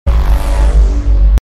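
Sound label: Pistola Laser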